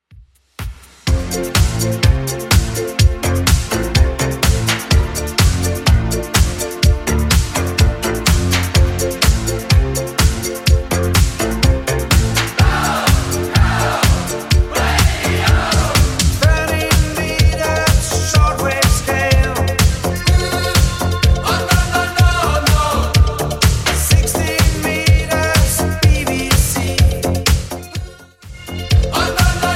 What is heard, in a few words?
80s Redrum 125 bpm